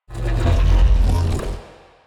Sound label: neuro, bass